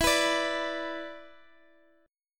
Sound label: B-Minor Double Flat 5th